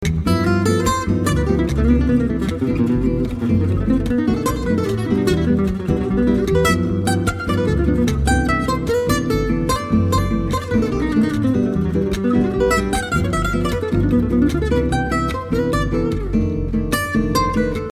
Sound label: Chorus